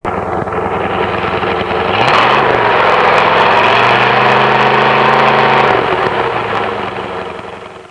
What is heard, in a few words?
wild short drag